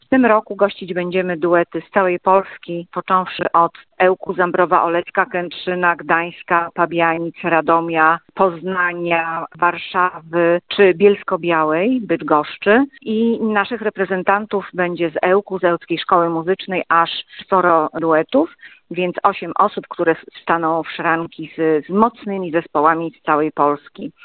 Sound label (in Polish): fortepian; viii miedzynarodowy konkurs duetow fortepianowych